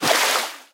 Surf Turn; Talking Tom Gold Run

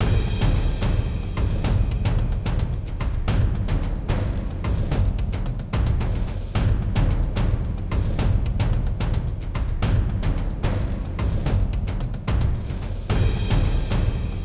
Garden 1 Perc

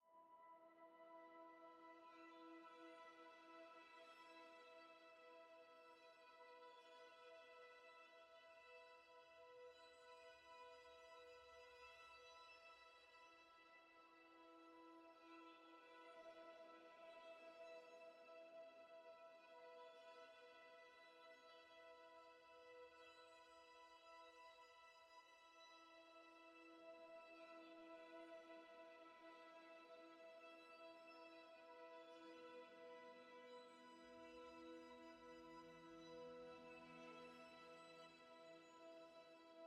tonal Amb String E; Ori and the Will of the Wisps

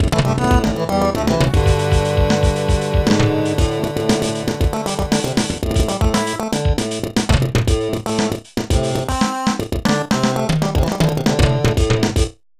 High Score Table; Street Fighter Alpha 2; SNES

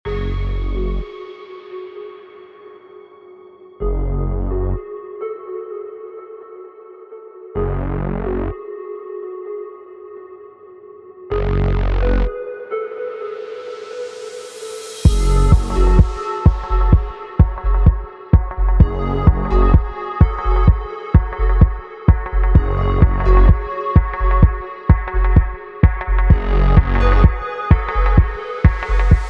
Main Menu Theme 128bpm; wildcat gun machine ps4 switch windows xbox one gamerip 2022

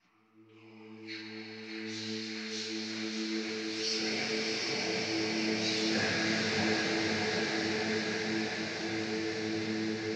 ambience; snuksnake; Rage in Peace

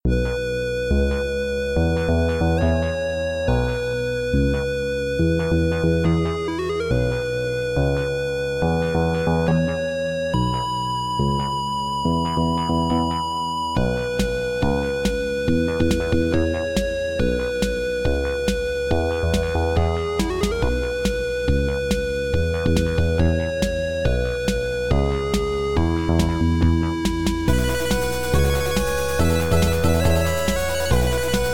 Music Tutorial

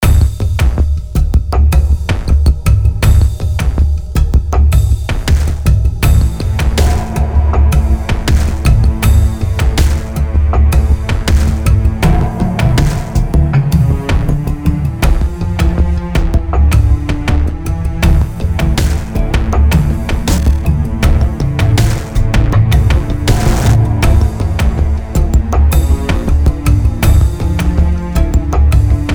Electricity; Everspace

